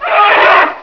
hit, Dragon sounds; Unreal

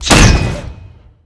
Alt Fire

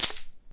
coin pick

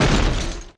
stone footstep; statue

